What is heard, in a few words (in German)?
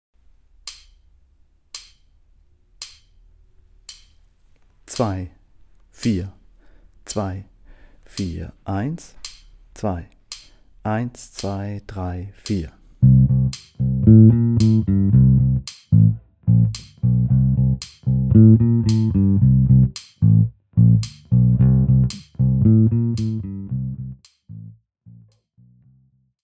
backbeat klick